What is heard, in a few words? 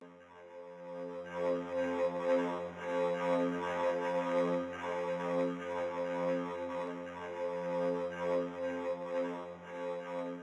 ASR10 Looped Vocod